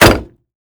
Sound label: Bullet hit metal; Doom